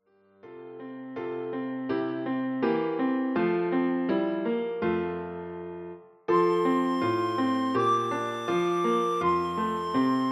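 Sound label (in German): O du froehliche, Sopranblockflöte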